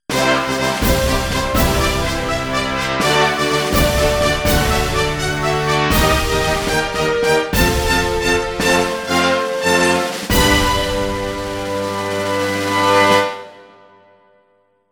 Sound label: Fanfare; Champion Jockey G1 Jockey Gallop Racer; Xbox 360